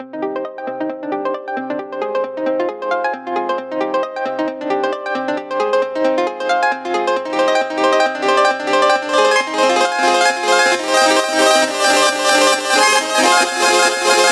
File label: arpeggio; Ableton's arpeggiator; progressive trance lead sequence